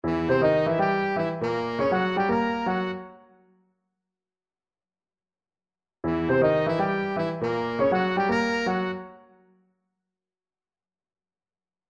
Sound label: hang up horns